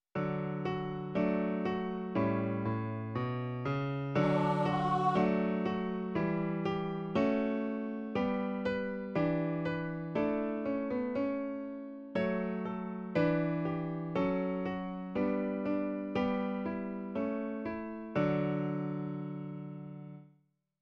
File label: thirds no voice